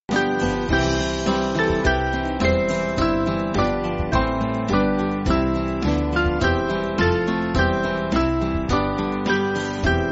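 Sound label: Band, Backing Files for Churches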